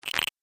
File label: Static Electricity Charge; Miscellaneous Electronic Sound Effects